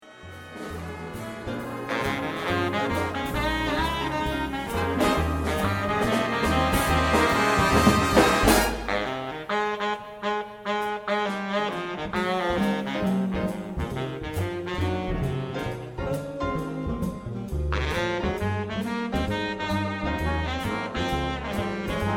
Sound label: bari sample